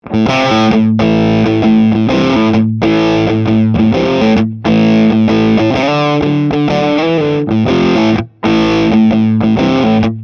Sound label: mesa boogie road king 2x12 channel 1 pushed gain